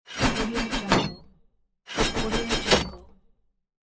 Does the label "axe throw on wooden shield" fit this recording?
Yes